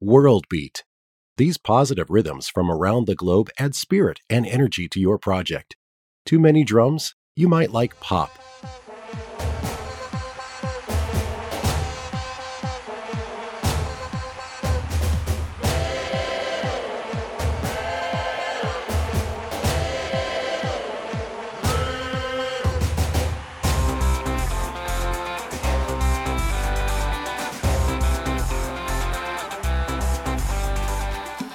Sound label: World Beat, music on hold